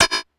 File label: percussion shiver